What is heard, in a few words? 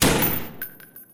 cross bow